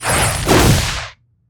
fire spell

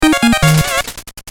collect; red skull

